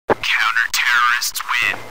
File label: counter terrorist win sound effect; csgo